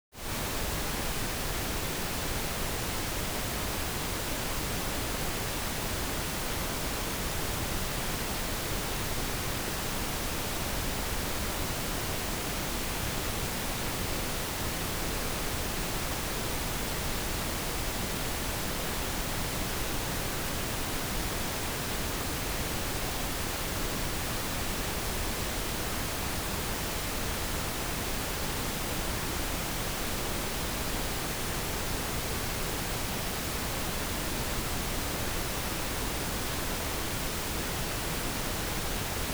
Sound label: pink noise 0db